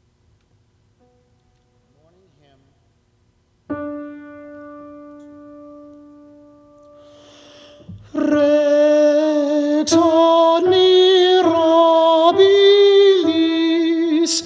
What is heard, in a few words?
morning hymn alto